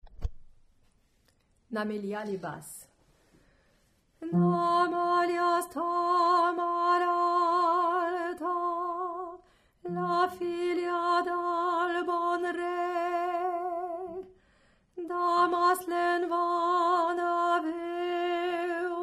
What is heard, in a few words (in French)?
Basse; catalan